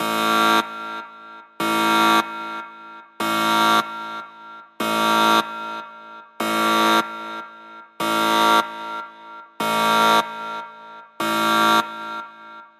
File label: Download Alert